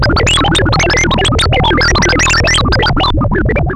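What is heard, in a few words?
Sample and Hold Filter, SFX; Syntec; Wall of Sounds VOL-2; TECHNO-BOX; 90s sample CD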